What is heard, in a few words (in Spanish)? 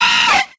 Grito; Wurmple